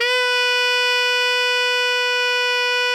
ALTO FF B 3, Sax; 4-WAY

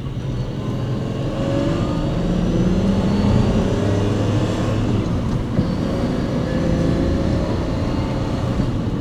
DRIVING, Back Ground; AKAI S6000 CD-ROM - Volume 6

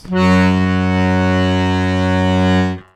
ACCORD BASS F#1

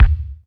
DM5 Kick, Alesis DM5 drum machine

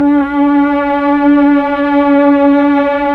STR, Melo. Violins, Tron Violins; Roland LCDP09 Keys of the 60s and 70s 1

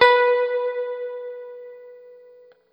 Guitar & Bass; Quantum Leap; 90's Sample CDs

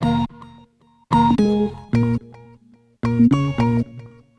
Tones; Sonic Foundry; Sony Creative Software; Stylus Pressure Urban Grooves on Digital Wax; Nu Tang

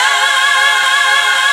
RAVE

